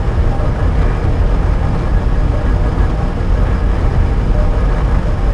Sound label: ore machine, ambience; Star Wars Jedi Knight II Jedi Outcast; XBOX; artus